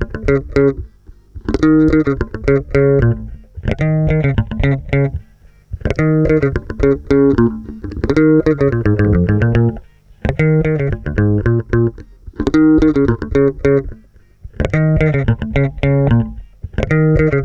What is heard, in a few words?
WORLD 110, Bass Grooves; USB Soundscan vol.30; AKAI